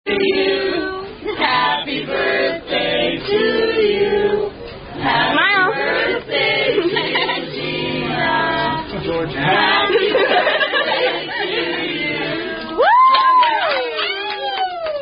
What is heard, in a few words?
Happy Birthday (Standard) Sung By Wait Staff; Outback